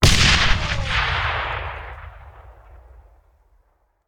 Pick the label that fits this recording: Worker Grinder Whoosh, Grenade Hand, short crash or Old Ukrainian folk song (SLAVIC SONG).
Grenade Hand